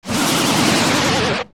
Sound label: fall; Volvagia